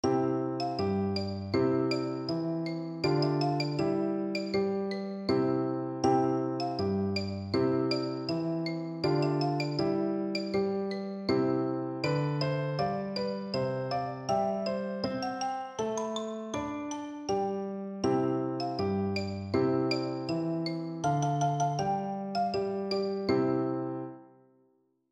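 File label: xylophone, percussion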